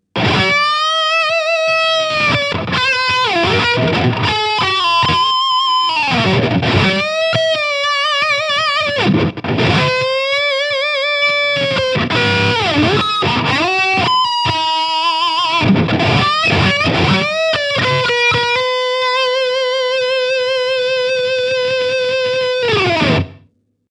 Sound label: LTD MG 1001 High Gain